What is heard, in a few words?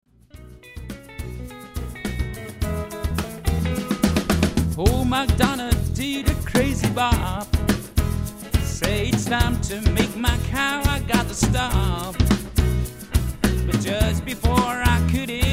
Crazy country hop